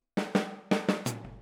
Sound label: Boogaloo Hi Hat, 82 BPM; Sonic Foundry (Sony Creative Software); Drums from the Big Room The Mixes